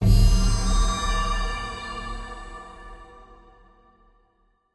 wild Spin Outro; wild bazaar

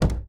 reelBounce; riches of midgard

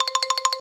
count up loop, blackjack; blackjackclassic

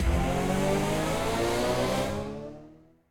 respin Snd, slots; king of slots

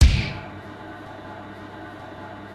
spin Start

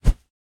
winbannerhide; blackjackclassic

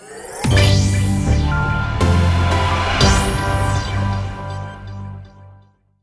intro free spin; dazzle

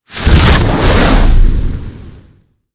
fire Big Win; narcos

